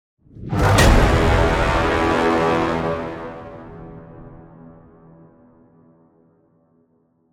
Impact Braam Metallic Low End Synth Brass; Epic Transitions and Hits; Daruma Audio

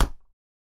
Soccer Ball Header Thud; Stuart Duffield

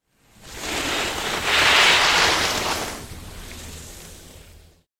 Ski Sliding and Braking on Snow; RZ Post

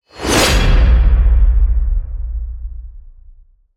Transitions Impact Massive Metal Shock; BOOM Library

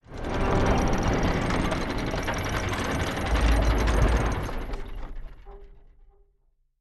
Dungeons Metal Gate Opening

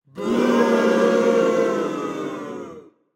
Cartoon Boo Audience Dislike; Omnibit Sound; Cartoon Nonsense

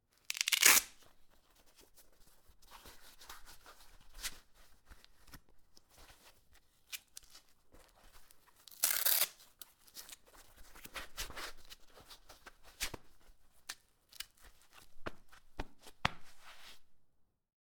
Home Gym Putting Workout Gloves On; Borrtex

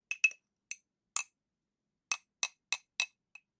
Spoon Mug Clinking; Artlist Original

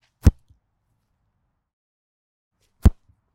Soccer Ball Kick Side of Foot; Airborne Sound

